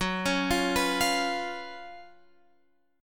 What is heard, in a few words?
Gb Major 7th Suspended 4th Gb Guitar Open F